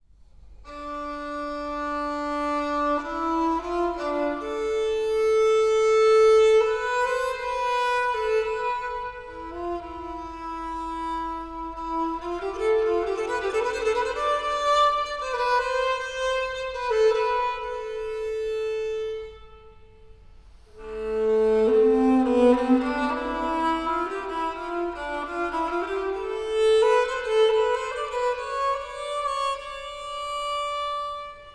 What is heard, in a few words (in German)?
renaissancevioline; musikinstrumentenmuseum